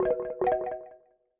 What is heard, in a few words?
calls outgoing ring; Slack; macos